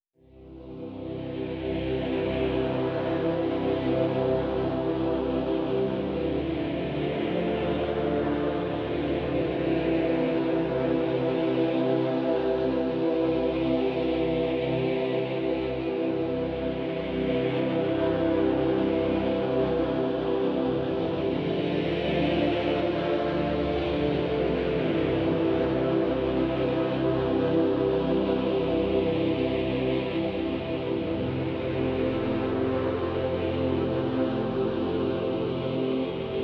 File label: Drone